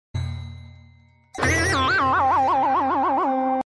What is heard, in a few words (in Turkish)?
Şaşırma Efekti